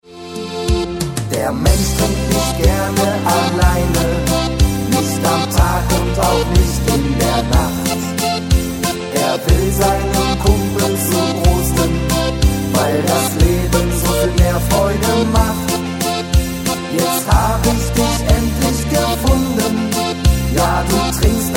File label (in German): Zwei Alt